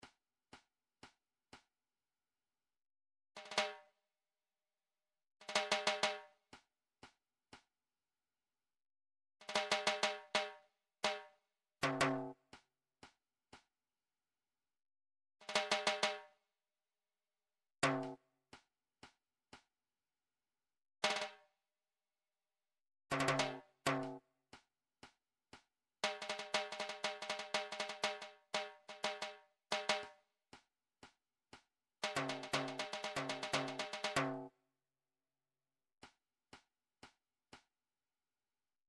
drum trax timb

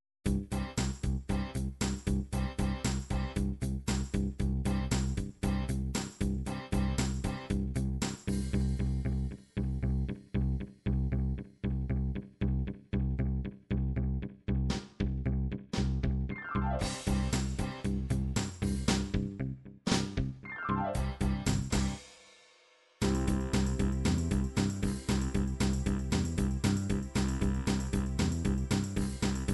pop rock

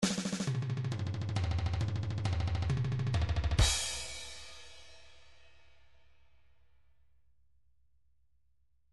trance midi loops fills; Newtronic Trance Constuction Kit

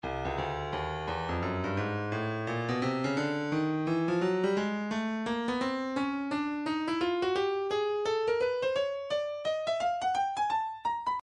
breakbeat 086 bpm; Stylus Breakbeat Midi Files; swagga e

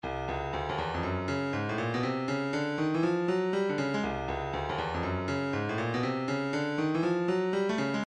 breakbeat 073 bpm; blue note a; Stylus Breakbeat Midi Files

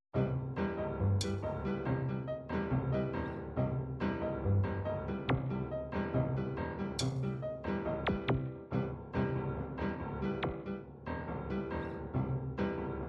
bossa nova bossa slow, midi

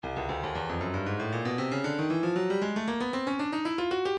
stylus breakbeat midi files 116 bpm; Icee A

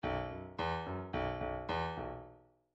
bdf midi file grooves rock d rock d

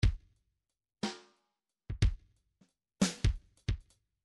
midi file grooves 3 4

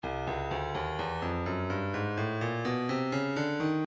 breakbeat midi 126 bpm disco; Cardboard Disco B; Stylus Breakbeat Midi Files

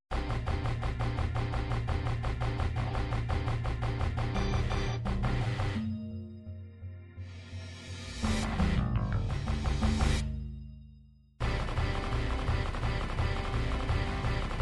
rock hard rock, midi

